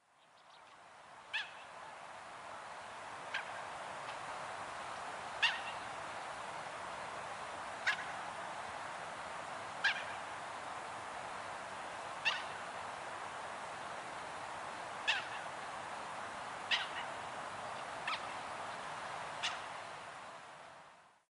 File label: Mandarin Duck; Birds